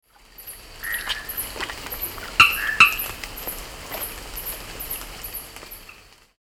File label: Spinomantis peraccae, amphibia